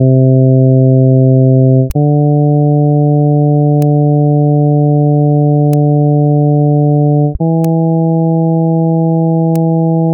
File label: blind sonification